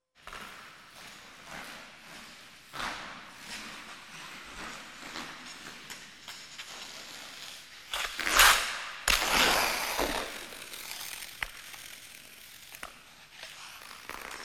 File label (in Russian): priblizhenie i pryzhok; Звуки катания на коньках